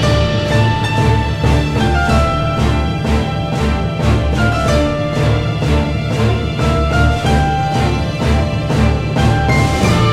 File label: Normal Battle Loop; Gran Saga